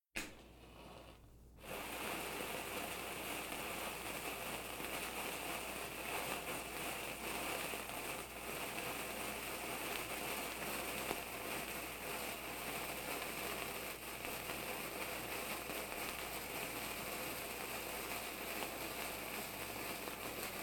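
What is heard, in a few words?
Fuse burning; KMP Film Video Sound Effects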